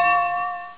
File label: warning